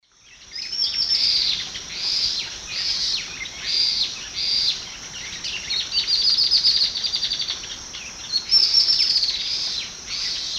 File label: Microrhopias quixensis, songs and calls 3 males; S. America; Eastern Lowlands